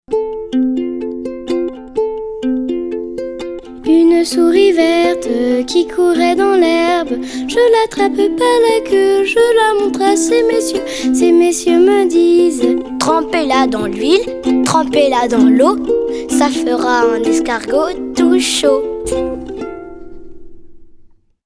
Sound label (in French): Une souris verte, comptines pour les tout petits; Livre Audio – Comptines pour les tout-petits